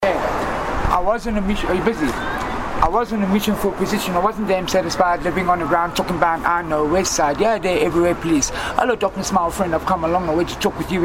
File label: rap